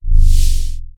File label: big orb appear; gg pure vessel